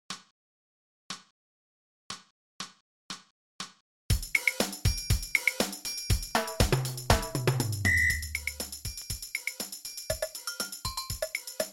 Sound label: Woodblock